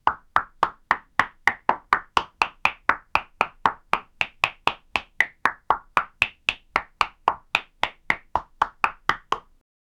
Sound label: cartoon crab footsteps, hard floor